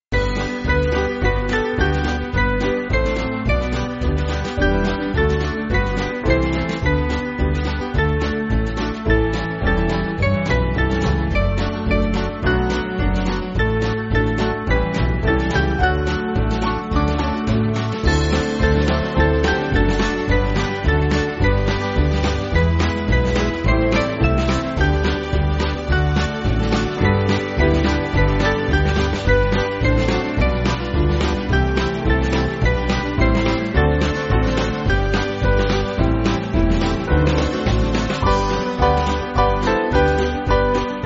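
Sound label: Band, Free Music Backing Files for Churches; Come Ye Faithful; St Kevin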